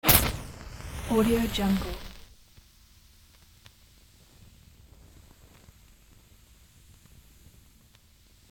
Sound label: match strike flare up; hollywood edge